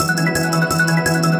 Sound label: win count loop; winnings; Xing Yun Jin Chan